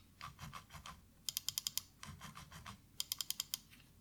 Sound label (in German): Scrollen und Clicken